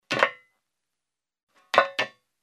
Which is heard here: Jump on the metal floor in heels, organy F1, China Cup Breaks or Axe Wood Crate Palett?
Jump on the metal floor in heels